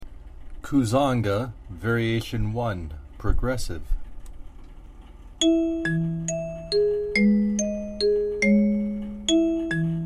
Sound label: Kuzanga Prog Var, kalimba